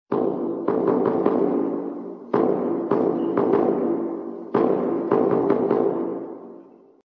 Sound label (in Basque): bonboa; Recursos para orkestra